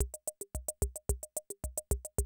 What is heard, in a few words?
CR78, DrumLoops